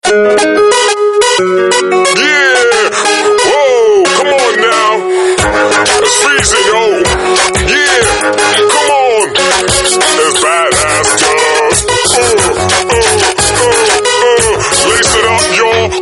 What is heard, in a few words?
Hip hop Guy; Samsung; SGH-F480; India